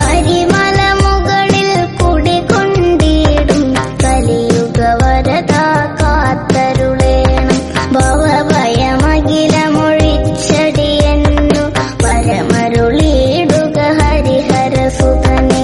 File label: devotional ringtone; ayyappa